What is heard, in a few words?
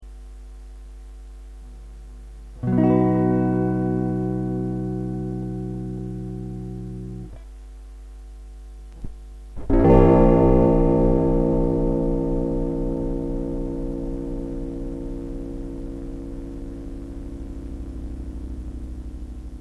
maestro ring mod